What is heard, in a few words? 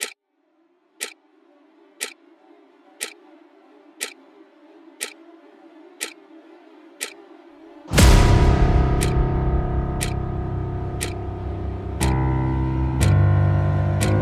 Horror